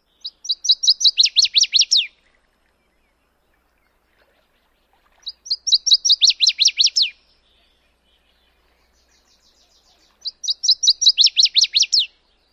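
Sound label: Northern Waterthrush, songs number 1; AK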